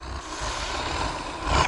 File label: creature snort, vocal elements